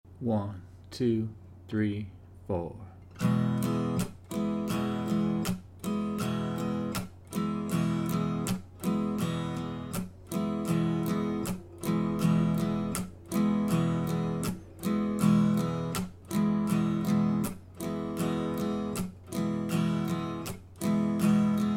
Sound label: strum